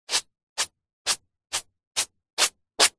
household hairbrush comb brush short hair med short strokes